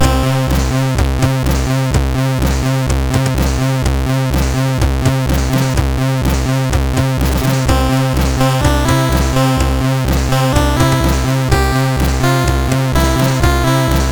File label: Casual Sound, sid MOS6581R4; hvsc; Lord SID